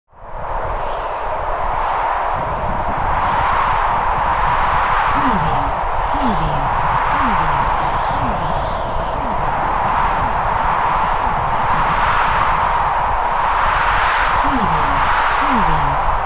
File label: WIND COLD